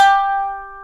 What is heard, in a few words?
MANDOLIN 2G 3E; AKAI S-Series CD-ROM Sound Library VOL-7